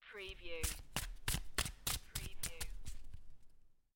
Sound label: Playing Cards Shuffling Cards In Hand